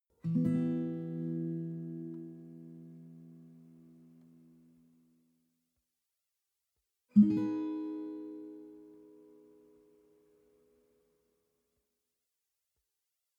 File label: western guitar chord high minor soft